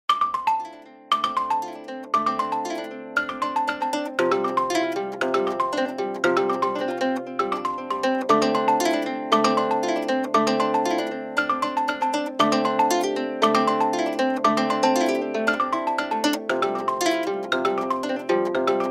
Motorola Original Ringtone

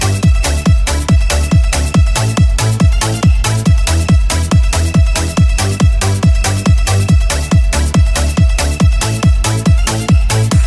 Vivo ringtone

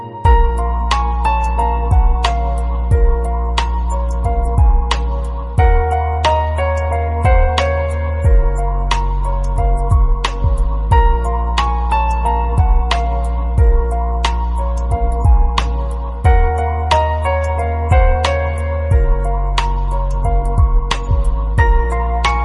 Soft Piano Ringtone

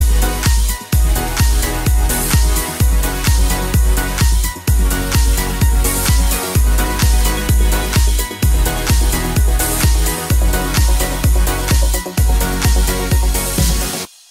Samsung ringtone; Wonderful

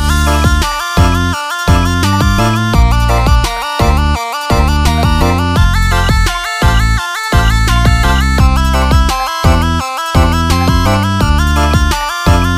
Trendy iPhone Ringtone

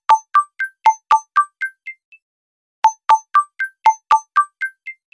Htc Message Tone

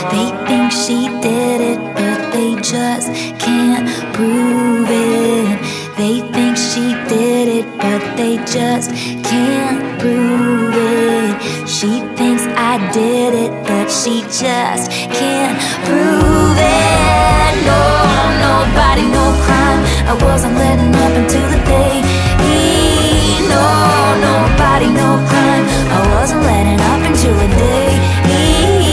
English Song Ringtone

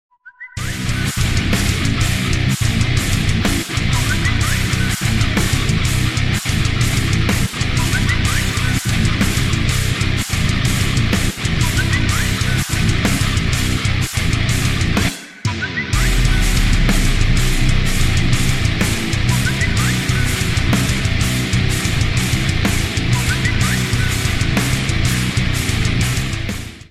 Samsung Ringtone

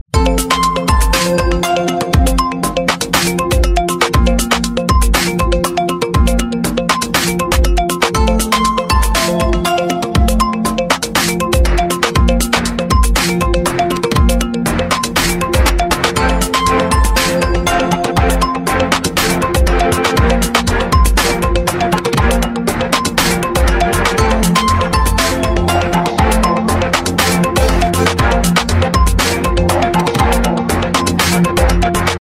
oppo f11 pro ringtone

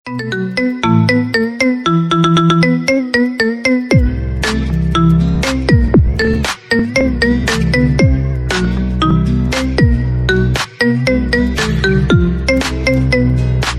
Marimba Ringtone Remix; iPhone 13

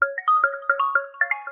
LG V60 ThinQ 5G UW Sms Ringtone

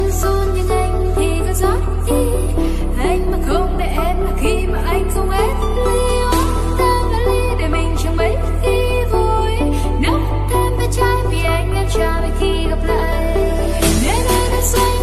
Chinese Song Ringtone

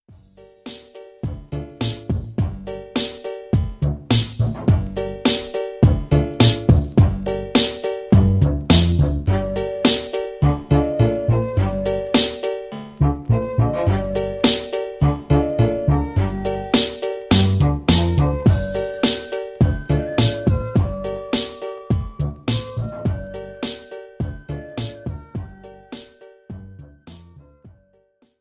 Flute Ringtone

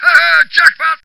jackpot Tone Ringtone

